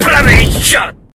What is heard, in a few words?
throw